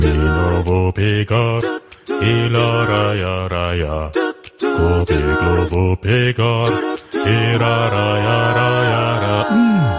acapella

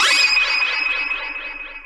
shine sound

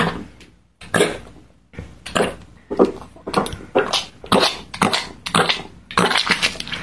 cat vomit

